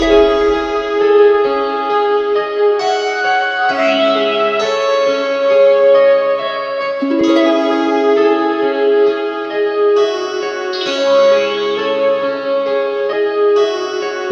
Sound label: piano and keys, sad loops